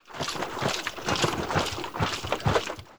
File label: FOOT; TOAW